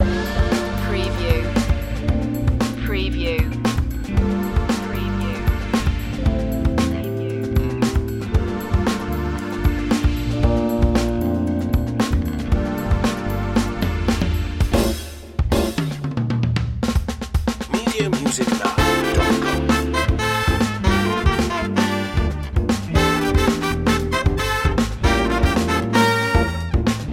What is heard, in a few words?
high funk loop